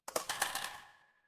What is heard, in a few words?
glass crack